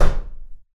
wood door knock

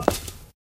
black tile, footsteps

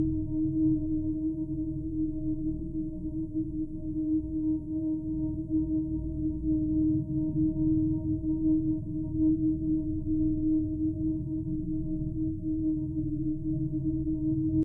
drone, ambient drones; Left 4 Dead 2; Source Engine

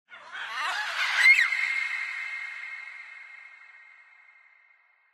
animal call bird weird; Source Engine; tf; lair

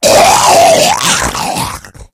rage at victim, infected

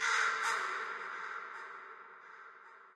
amb crow; video games; ambient; nature; source engine; portal2

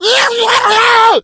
rage at victim, infected, male; Left 4 Dead 2; Source Engine

